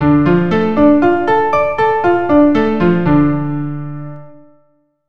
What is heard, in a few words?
Dm Arp 100, keyboard